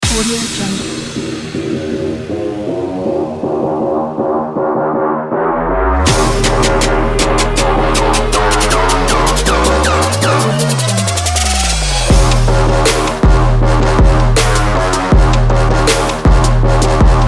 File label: the trap